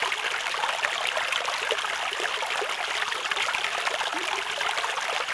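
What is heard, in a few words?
ambience pool small; Star Wars Knights Of The Old Republic II; XBOX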